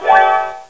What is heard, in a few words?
Harp Arppegio; DAVIDEMO